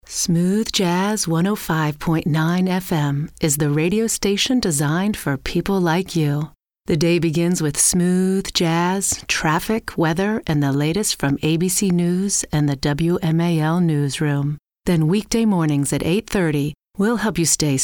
smooth jazz

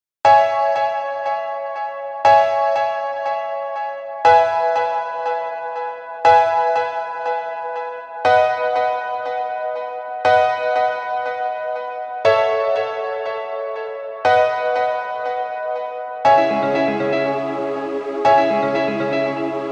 Karaoke

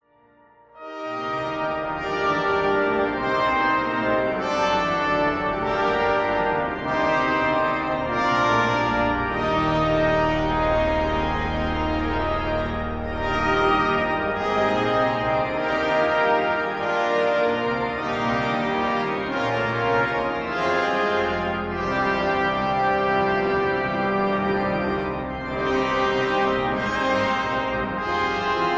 Festpreludium Over Fra Himlen

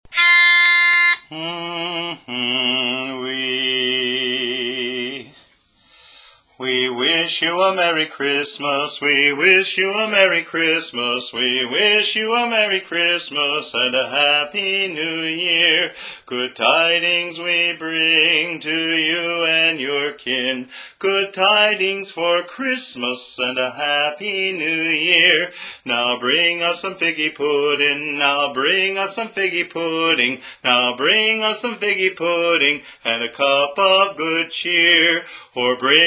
We Wish You a Merry Christmas (Lead)

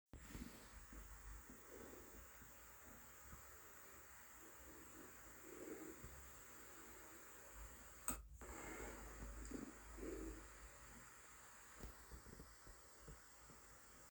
reverse

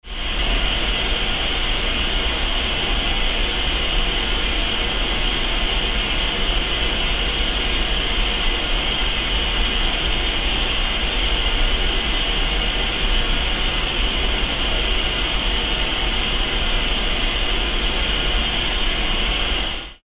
aircraft exterior boarding

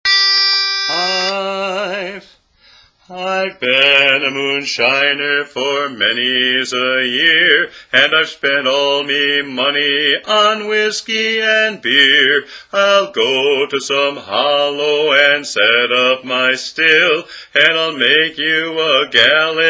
Bass; The Moonshiner

